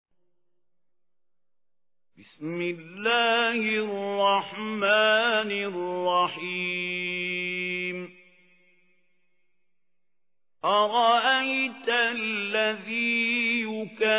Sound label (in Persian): تحقیق سوره ماعون; استاد خلیل الحصری